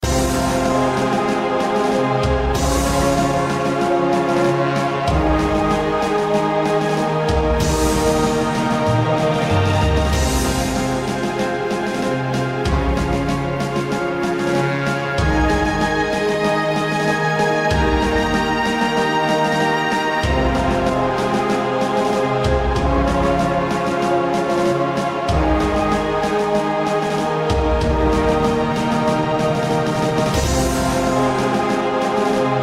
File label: Battle Music